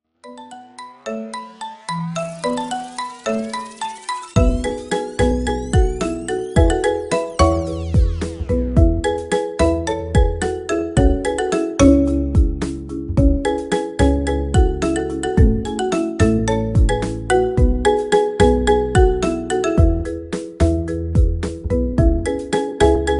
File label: marimba ringtone remix